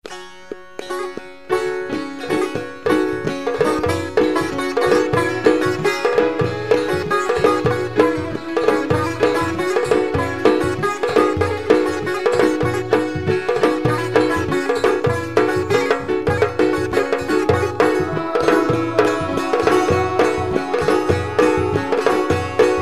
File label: Sitar